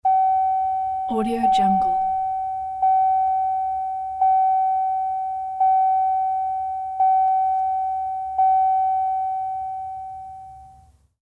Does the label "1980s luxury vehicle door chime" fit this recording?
Yes